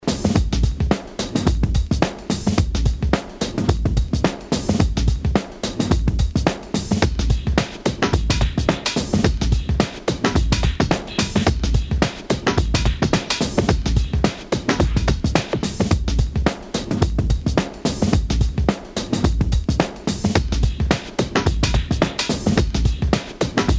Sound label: 105 BPM Swing